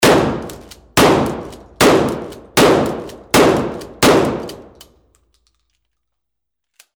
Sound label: 20 gauge shotgun shots